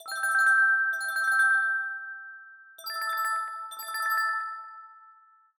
alarms abstract; Amazon; Fire-Phone

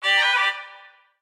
Cello, notifications; HTC Rhyme

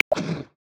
Wombat; Xiaomi Redmi-Note-8-Pro

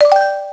Shutter; Motorola PEBL VU20; Camera

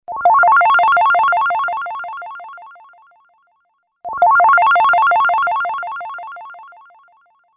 Single Tone; LG; CF360

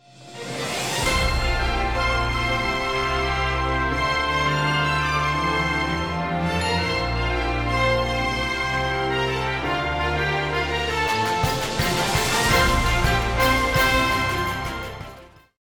Media volume; LG G3 Screen F490L

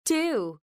Key Tone

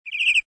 Folder Open; Samsung SCH-i539

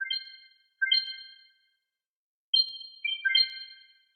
Early Bird, ringtones; Pixel Sounds

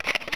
Shutter; LG U300

